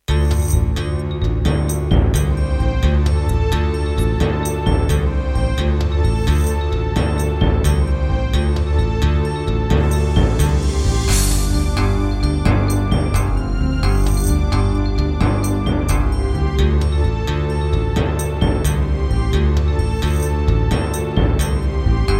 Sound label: Serdaco Dreamblaster X2GS NATIVE, wavetable; Heretic